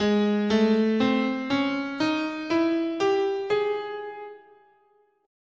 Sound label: G sharp Double Harmonic Major, scale